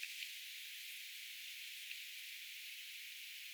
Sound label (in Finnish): siina on ilmeisesti sinipyrsto tai joku lahilajeista ehka huono aanitys